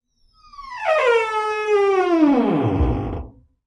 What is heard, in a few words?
screech, squeaks